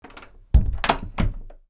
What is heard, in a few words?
Door close